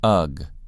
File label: ugh